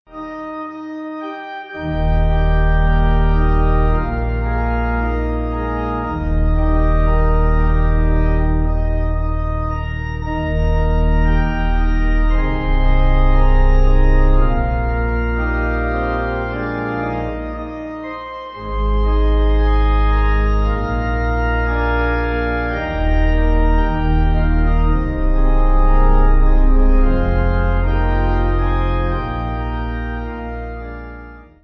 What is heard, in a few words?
Slow Pipe; psalm 23